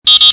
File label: fail